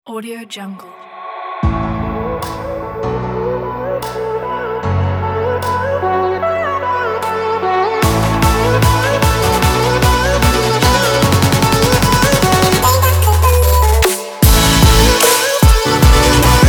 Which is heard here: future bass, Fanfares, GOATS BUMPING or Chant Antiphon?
future bass